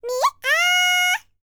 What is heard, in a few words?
BLACK SEA, World Voices; ILIO; Vocal Planet VOL-5